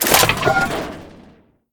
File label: pick armor